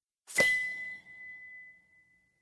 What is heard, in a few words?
Note B